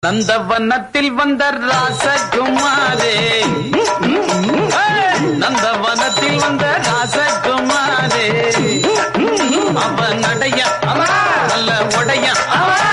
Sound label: Tamil Ringtone; Karakattakaran